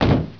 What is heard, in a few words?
player jump land